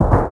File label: expl far start, explosion; World of Tanks Blitz